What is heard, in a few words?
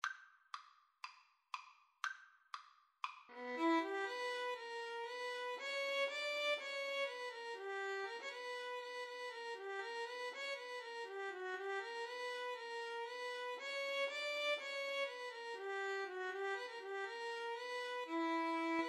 Bulgarish violin